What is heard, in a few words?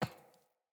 Grunt Boot Walk Metal, Footsteps, Boots; Enemy; Sinecure; Melee Grunt